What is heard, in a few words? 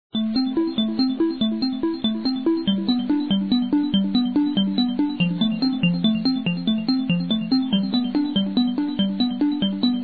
game bg